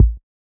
Kick

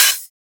Open Hat; FLPRO

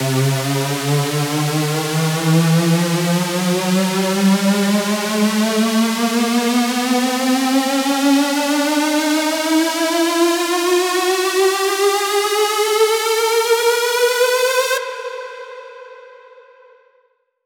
Square C 8 Bars

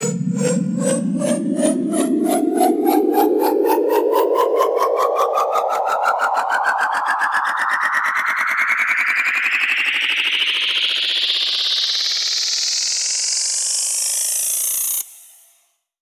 Loop And Noise

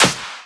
Clap; FLPRO

